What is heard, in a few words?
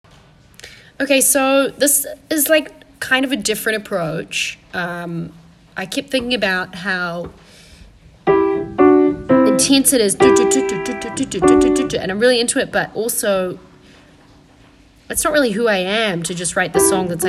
Weird potential mid 2000s style chorus for big tough song